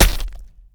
Target Mouth Gobbl; Levels Hub Diner

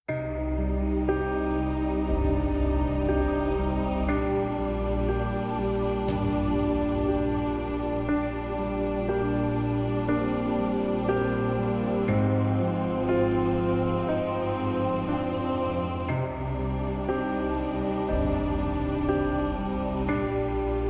Die; dehumanized

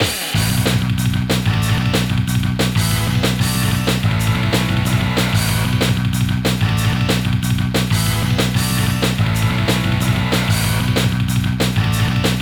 High Score Table; Rival Schools United by Fate; PS1

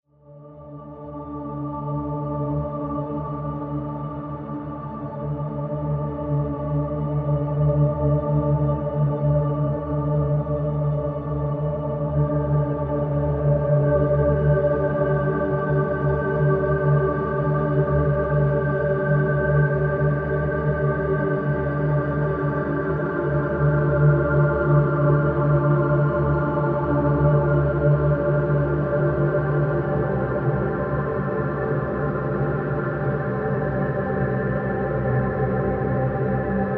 Calming Waves Ambient; Vanishing Realms VR

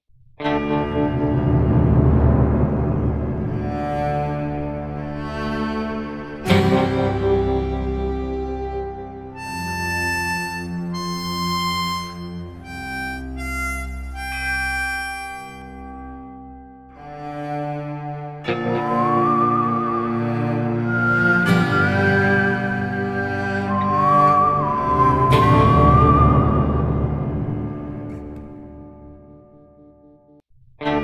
Loading; Call of Juarez: Bound in Blood

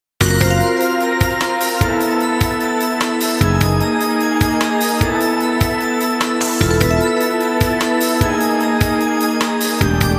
Music Success event

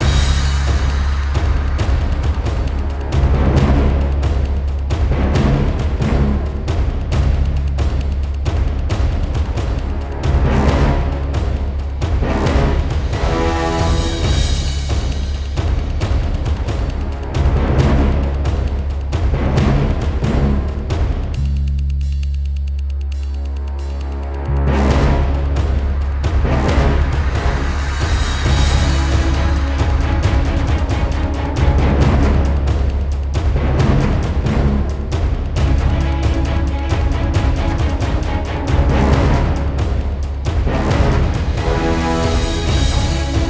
Music Engagement; Last Encounter

